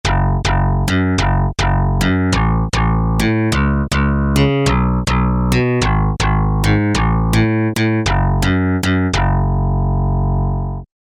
Dirty FM Sus, Peavey DPM Spectrum Bass

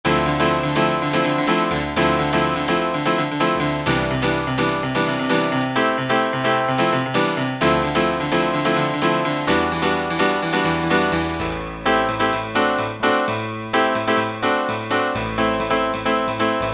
Dance Piano, Roland SR-JV80-06 Dance

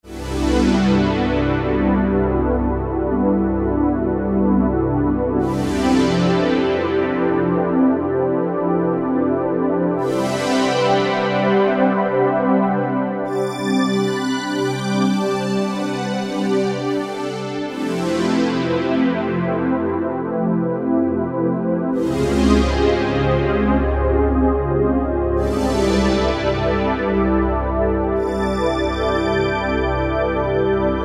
Pd Dreamsphere; Yamaha EX5R; Factory presets

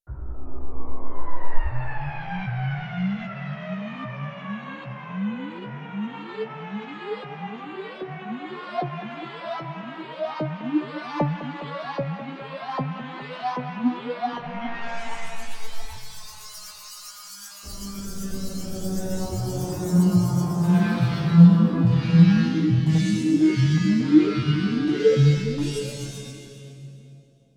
Lab Jet, Roland D-70; Factory Performances

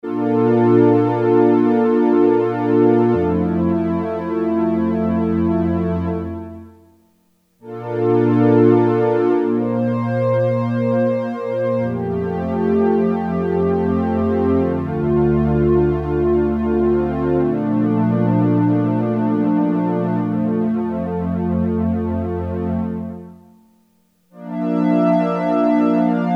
MELLOW STRINGS, Roland Jupiter-8; Factory patches

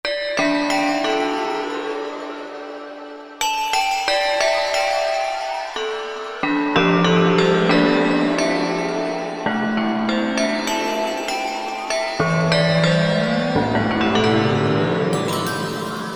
bell; Korg MSC-10S Ethnic

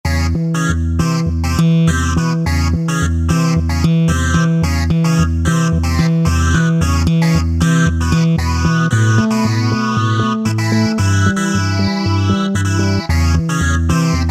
Techno Split; Roland SC-880; Factory Patches

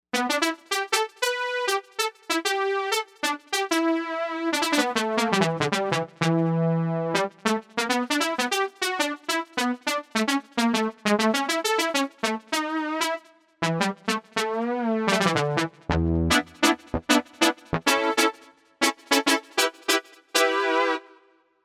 Shuffle Bleep, Novation UltraNova; Factory Patches

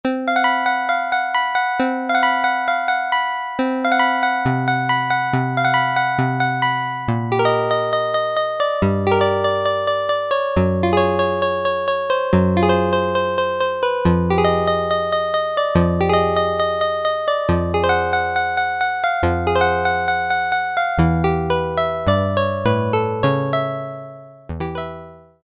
Bright Pluck, Roland Juno-106; Factory Preset

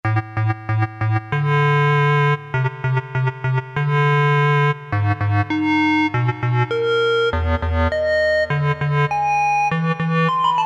clarinet + envelopes, Casio MT-68; tweaked preset sounds

